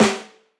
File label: big steel snare drum g sharp key